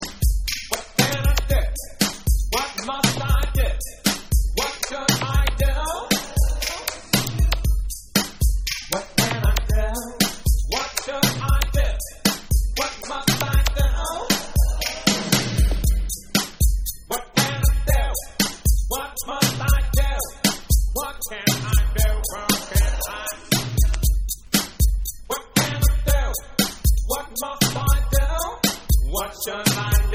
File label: 808 Vocal Mix